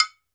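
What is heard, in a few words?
TOKE BELL, African Perc; E-MU Producer Series Vol. 7 – Old World Instruments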